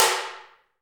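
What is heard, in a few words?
T DRM, talking drum, Heart of Africa; Spectrasonics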